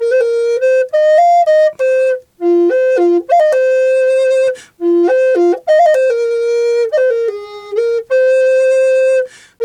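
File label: flute, 100 BPM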